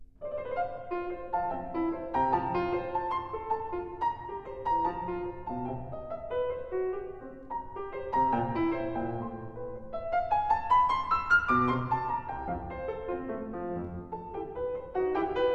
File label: Variations for cello & piano in F major